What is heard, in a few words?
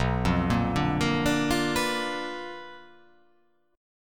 B Minor Major 7th Flat 5th, chord